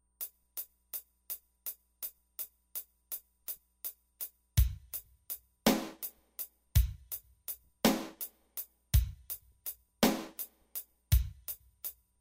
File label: drum only; BR-1600CD; Programming Drums